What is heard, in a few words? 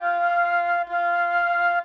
Flutes, Orchestral; Sonic Foundry (Sony Creative Software) - Orchestral VOL-2; Modern 38